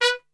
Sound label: B 2 X PIC SHT, Brass; Best Service; Brass Super Section